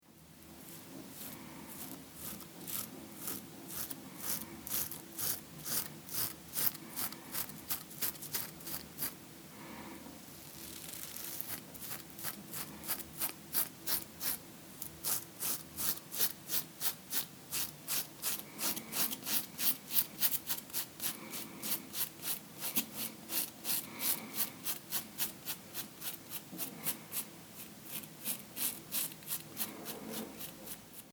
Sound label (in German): nassrasieren